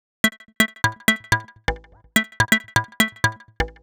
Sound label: Minimal A, Synths, Underground UK House & Electro; Sonic Foundry (Sony Creative Software)